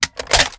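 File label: reload extended mag mag out; gmod; viper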